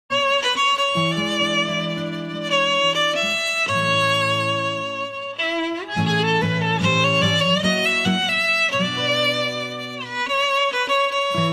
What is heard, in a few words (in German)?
rumaenische rapsodie; sharaban